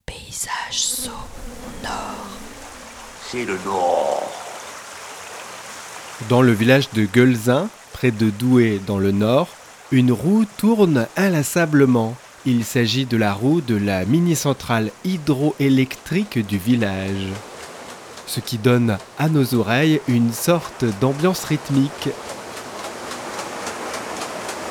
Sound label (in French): La roue hydroelectrique; GOEULZIN; Hauts de France; PAYSAGE SO NORD